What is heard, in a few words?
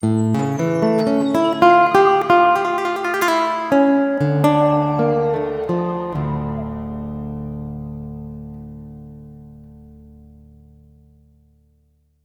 Lyricallute; underworld ascendant